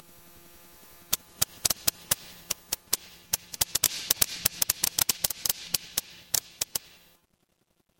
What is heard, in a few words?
fire electric arc